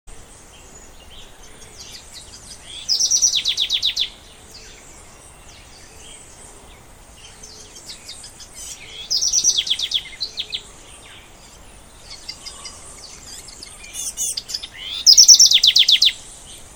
House Wren; NJLarsen

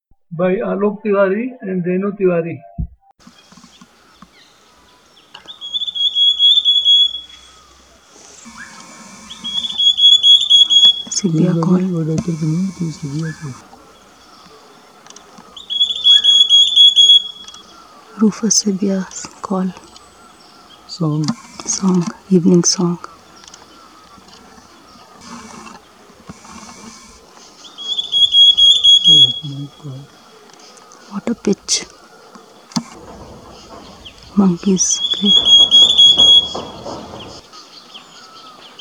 Rufous Sibia song; Alok

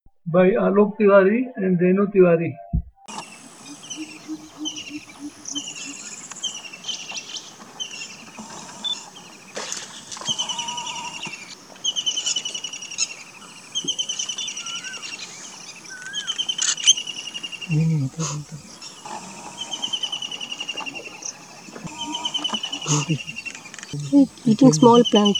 White-throated kingfisher call; alok

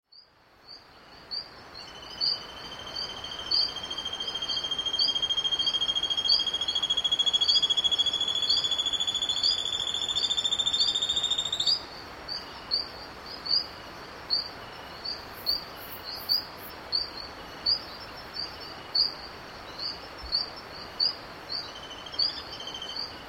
Black Cheeked Gnateater Song; Regua

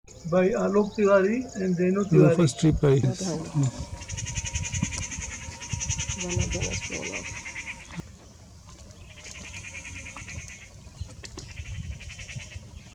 Rufous Treepie

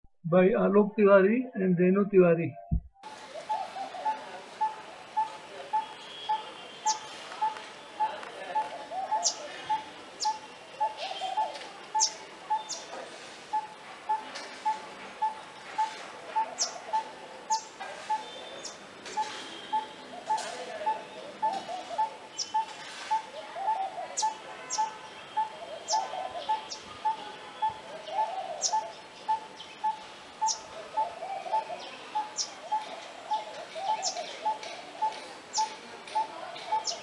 Coppersmith barbet; alok

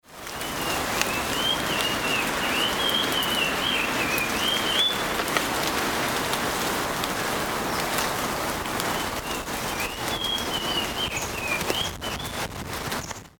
Grey Headed Canary Flycatcher

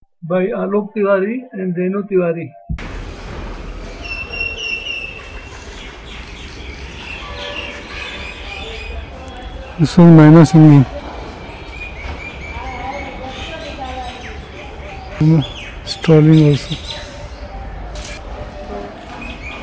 Common myna song

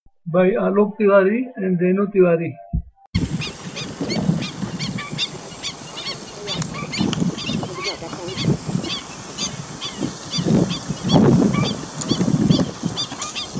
Black-winged stilt; alok

